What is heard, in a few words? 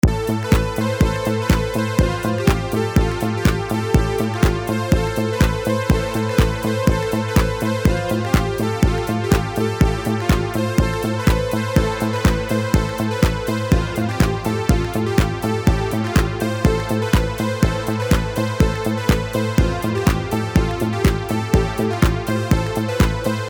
Roland JU06 vs Roland Juno106 Mix